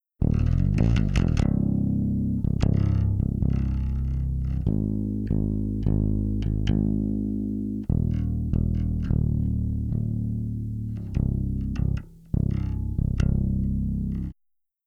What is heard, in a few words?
bass EL8X